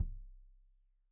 Kik Stereo; Deeply Sampled Drums

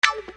Guitar Hit; Construction Kit; HIP-HOP; Sonic Foundry (Sony Creative Software)

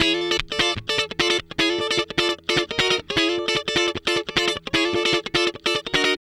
Guitar Licks

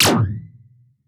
weeee

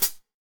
HH FOOT, HR-16 Drum Mashines; EdgeSounds

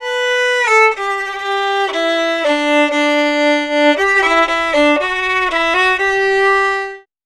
Fiddle, Appalachian

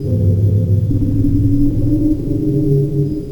Cavernous Drone; Sonic Foundry (Sony Creative Software); Loop Noir

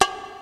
DRUMS; Rubber Chicken Software Sample Bank

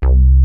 RESONATOR, MINIMOOG

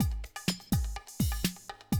125, Classic Drum Loops

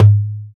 Doholla Dom, Roland BK-5 Percussion

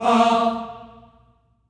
staccato F A#3, Voices; Spectrasonics; Symphony of Voices

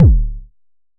909 D1, Kicks; Drums; MBase 11 - 909

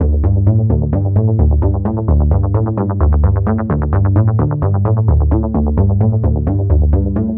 D Bass Classic Warm; Zero-G; Future Wave

